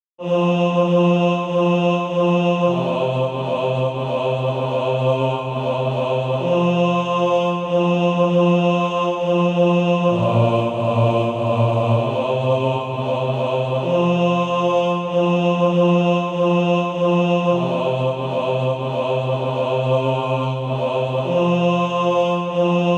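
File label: bass, Practise Music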